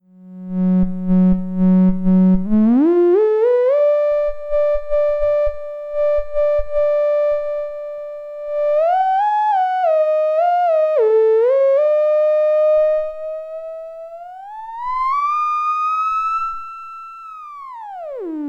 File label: opto theremin; opto vco